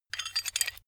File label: metal handling pieces